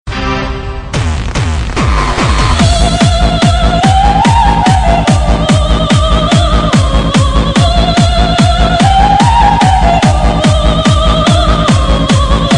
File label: Hardstyle; Hardstyle Republic